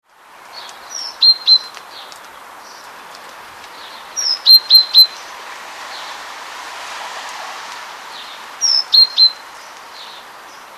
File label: great tit